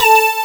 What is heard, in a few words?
DG WAVE, DIGI SYN